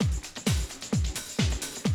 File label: 130, Classic DrumLoops